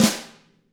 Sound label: DW FLM, SNR; ILIO; Double Platinum Drums 1